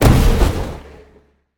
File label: boar attack; smite; god artemis